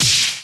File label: Ball Hit Killer